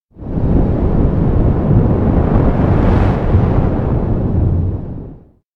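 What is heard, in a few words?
electronic black wind near; anemoi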